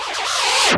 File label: Misc Short FX